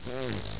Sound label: gurp; quake2; prabbit